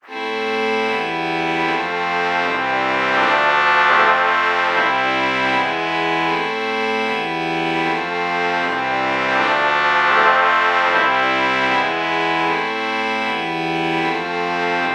trumpet violin chord morph

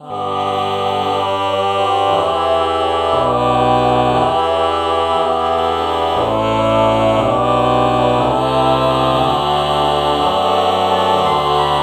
ah ah morph

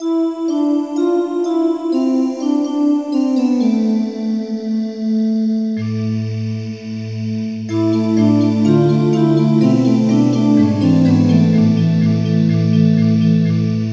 space voice

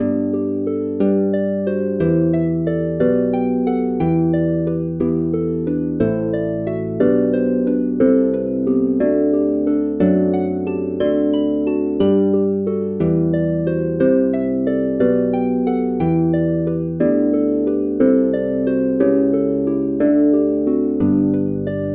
harp

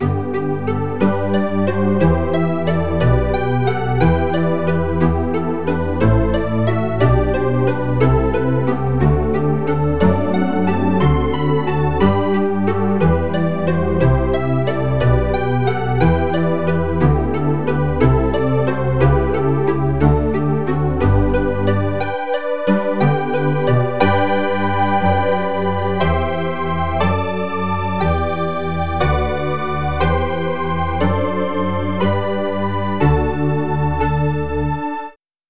calliope lead

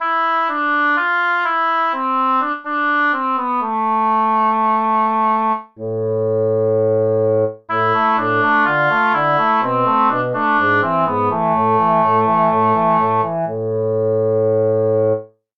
oboe

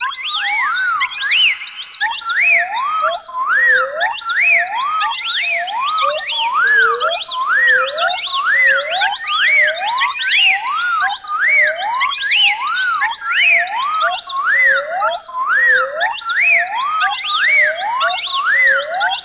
bird tweet

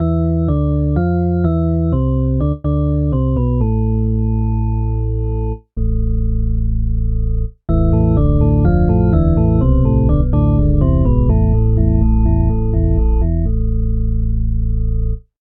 drawbar organ

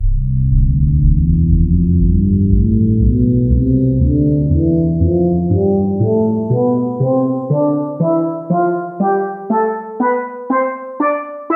steel drums tonleiter

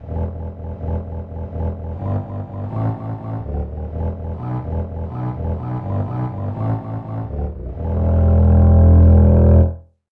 violin bass

